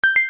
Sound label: success